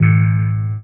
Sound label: slap, Bass; Notes